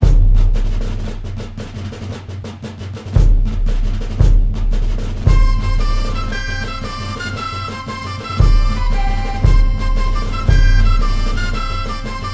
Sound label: Fife and Snare on the March